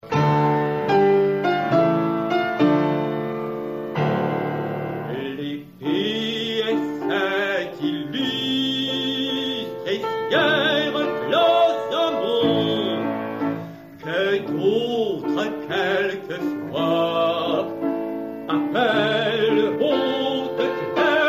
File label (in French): avec piano; Le Mariage de Roland (extrait avec piano)